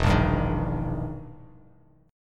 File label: E Minor Major 7th Flat 5th, E, down, Bass Standard; strummed; JGuitar